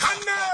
Moombah Vocal; Moombah Kit; Hip Hop; STYLE KITS (Hip Hop, Etc)

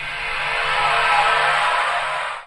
Electro FX; Ueb HE Electro FX

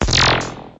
big laser, electronic hits

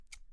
Scissors Shears; Scissors Kit

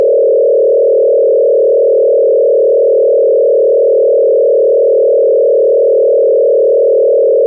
laser treatment, Electro and Synthetic

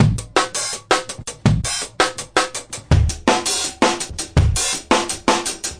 two pitch breakbeat, 165 bpm

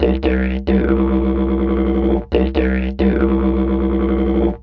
didg roll, didgeridoo; world

